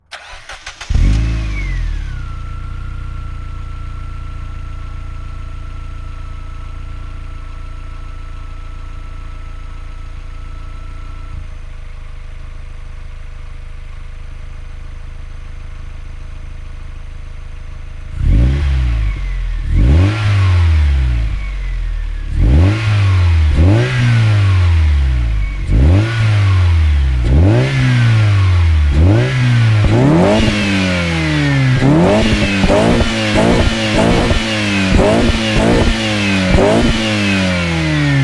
Mazda Miata MX5 NBFL; sonniss gdc

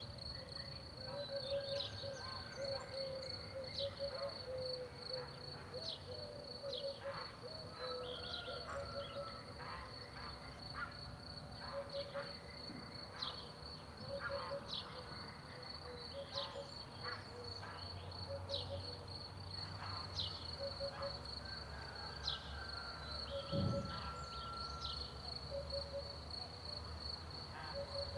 countryside bird morning chorus quadro; sonniss gdc

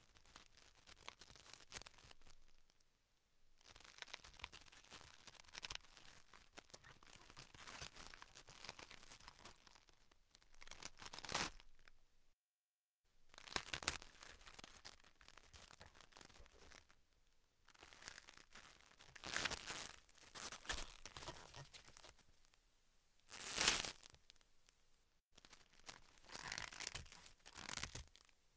break kale long; sonniss gdc

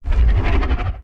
dry t rex roar; sonniss gdc

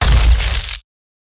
Impact, weapon; lancer weapon fx

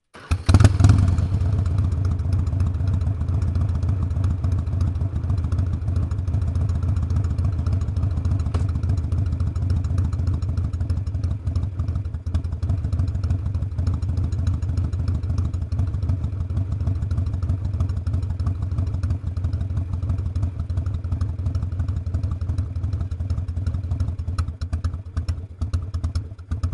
harley start and long idle back firing; sonniss gdc

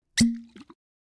cork bottle; consumables potions vials; psfx

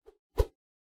meleeattack swoosh light, weapon swooshes; psfx

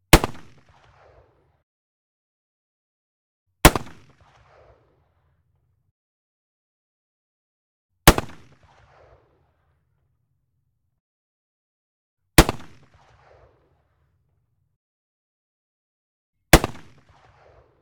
m1903a3 firing 1m right above; mkh8060; Sonniss GDC

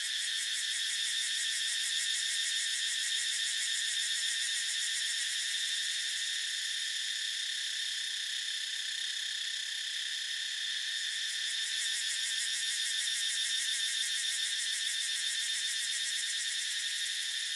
cicada ambience morning jungle thailand; Sonniss GDC

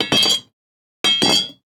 knife sword drop wood; Sonniss GDC

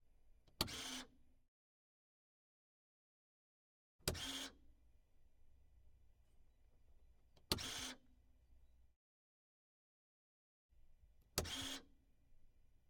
Textron Wildcat Sport engage 4 wheel drive; mkh8060; Sonniss GDC

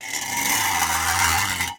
scratching knife on metallic jagged surface; sonniss gdc

